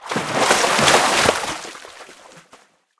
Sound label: fishing catch